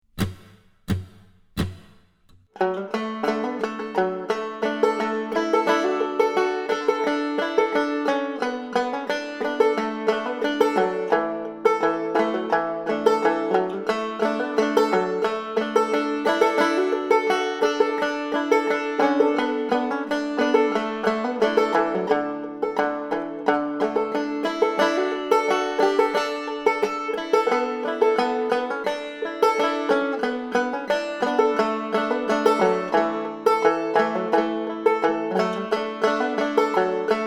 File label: Guitar Backing Track w Clawhammer Banjo; Bonaparte Crossing The Rhine